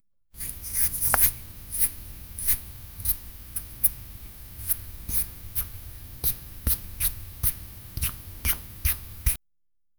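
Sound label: asmr sound effect of sprinkling; grains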